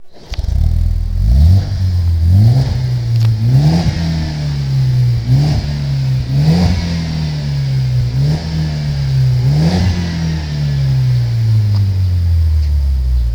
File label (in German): BMW 1er